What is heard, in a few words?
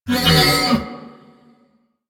Ram Grunt; Animals; DE; Warframe; Quests; Duviri